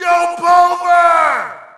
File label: Shout, audience; UFC Tapout 2